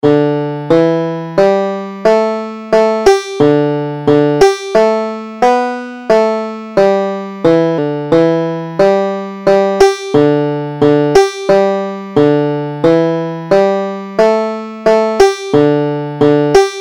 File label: banjo